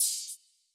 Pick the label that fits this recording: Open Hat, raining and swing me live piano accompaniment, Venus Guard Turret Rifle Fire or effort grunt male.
Open Hat